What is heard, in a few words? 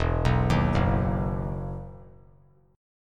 E Major 7th Suspended 2nd; EM7sus2 arpeggiated